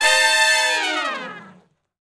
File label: Long Falloff (F), Horncraft; Sections